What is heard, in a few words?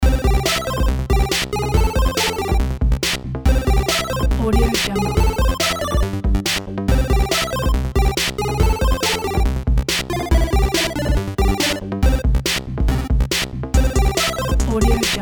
arcade retro 8bit gaming music